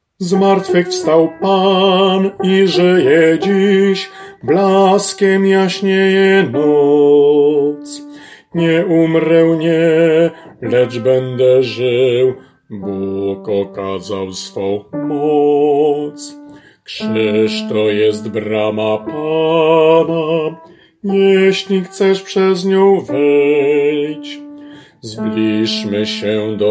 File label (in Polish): BAS; Zmartwychwstal Pan